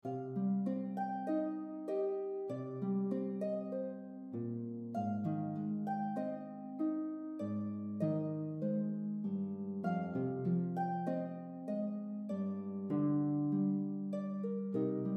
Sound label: Tune Soaring In The Wind Light Through Waterdrops, Cm Sib7, harfen